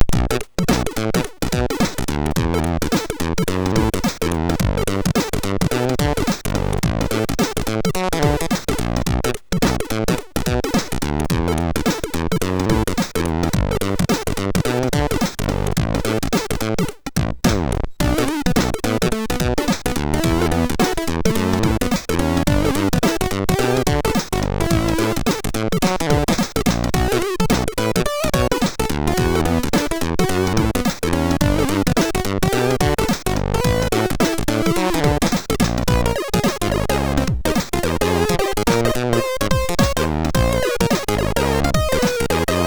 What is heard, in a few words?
MOS6581